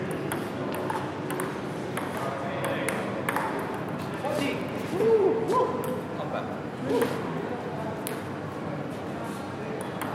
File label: ping pong match in spitalfields market london